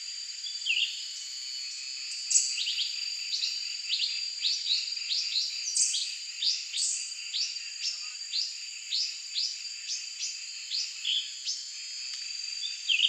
Blue winged Leafbird Chloropsis moluccensis, blue winged leafbird call